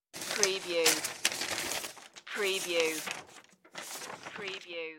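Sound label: Handling Paper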